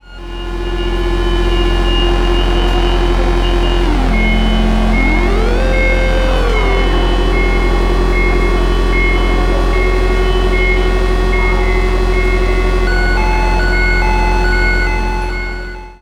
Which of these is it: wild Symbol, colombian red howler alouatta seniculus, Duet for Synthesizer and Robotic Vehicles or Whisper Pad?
Duet for Synthesizer and Robotic Vehicles